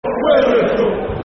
Will You Come To Leeds Football Chant (Soccer Song); fanchants; Leeds Lufc